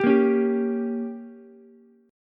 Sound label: G Diminished, Ukulele; strummed; JGuitar